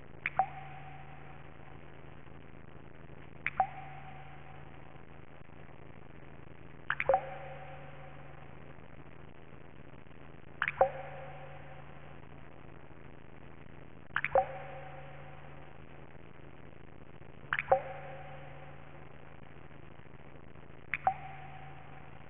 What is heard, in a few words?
blood drip